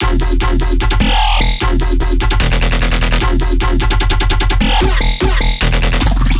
Bass Loop E Back and Forth, Dubstep, 150bpm; Ghosthack; Dubstep Sound Arsenal

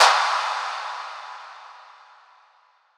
Impact Clap; Riddim; One-Shots; SFX; Ghosthack; Riddim Essentials Volume 2

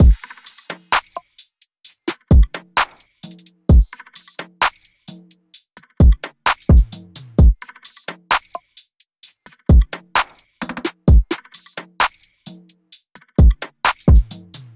Drum Loop 65 BPM; Peach; Cymatics; DOPE DRUMS VOL. 1

